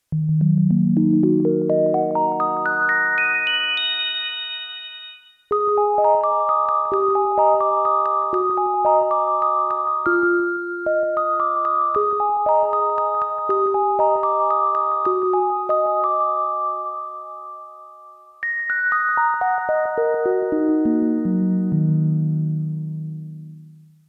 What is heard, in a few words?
Bell FM; micromonsta2